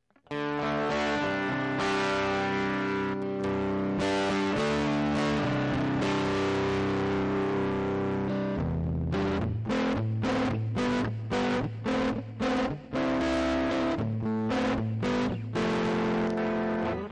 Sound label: B Fuzz Face